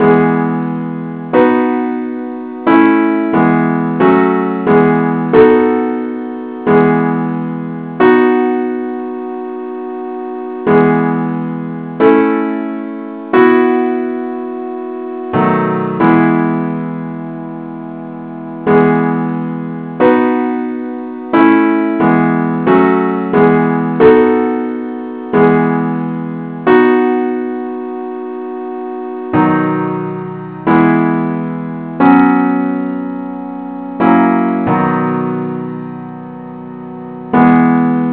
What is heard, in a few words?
simple Acc key e; Gwalchmai; Glory be to God on high